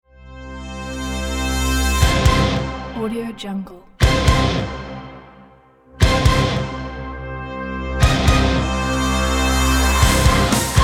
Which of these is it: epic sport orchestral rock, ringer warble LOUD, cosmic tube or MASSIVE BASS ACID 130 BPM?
epic sport orchestral rock